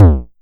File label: Vermona Kick; techno drum fx; Analogue DrumHits